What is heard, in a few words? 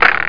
spring; ROTATOR